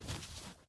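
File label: distant clear sound of fireworks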